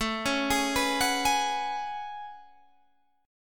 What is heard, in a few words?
A Major 13th, down Guitar Half Step Down